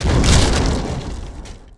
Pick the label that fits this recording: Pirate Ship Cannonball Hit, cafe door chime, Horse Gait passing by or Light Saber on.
Pirate Ship Cannonball Hit